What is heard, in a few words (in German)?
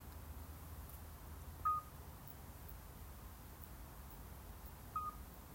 Geburtshelferkroete; Austmeyer